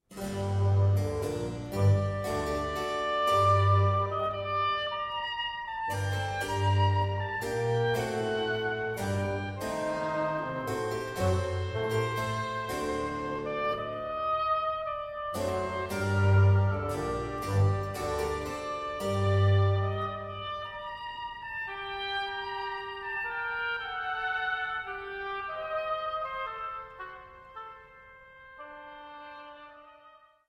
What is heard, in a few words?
Sonata In Bb Major for two oboes, bassoon, continuo; No.3; Mvmt III